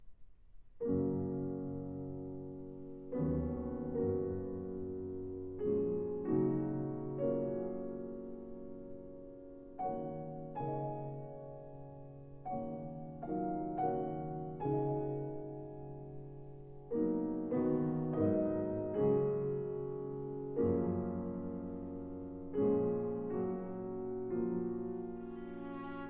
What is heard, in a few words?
Sonata for cello and piano, Cavatine